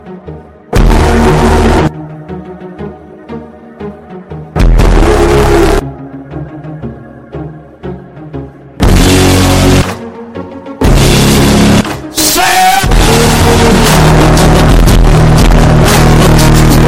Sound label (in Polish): fajny przester